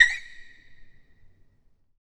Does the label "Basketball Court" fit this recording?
Yes